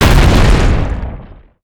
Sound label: Dragon Drag Lap Impact, creatures organic